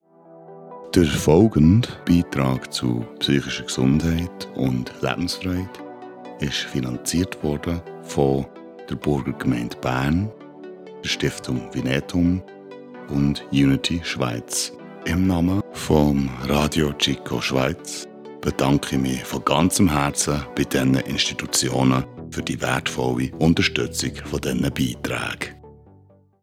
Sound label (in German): Jingle vor Beitragsaustrahlung; psychische Gsundheit